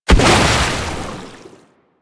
Bubblegun Pistol End Explosion; Warframe; Weapons; Tenno

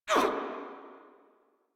Pain Grineer Female, Barks, Battle; Warframe

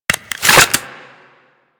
Reload; Warframe; Acrid

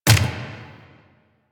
Turret Dry Fire; APC; Warframe; Lasrian

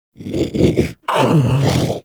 Predator Vocal Captured Idle; Warframe; Hunting Critters

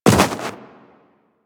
Run Snow, Footsteps; Warframe; Tenno

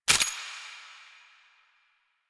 Pistol Reload Slide Pull; Weapons; Warframe; Tenno; Lasrian

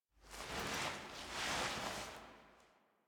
Apartment Foley; Warframe; Zariman; HubApartment

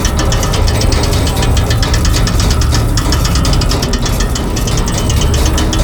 Library Floor Gate Loop; Duviri; Library Island

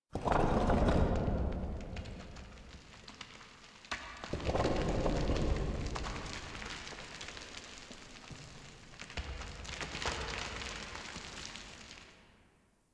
Dungeon Island Cave Crumble, Ambience; Warframe; Duviri

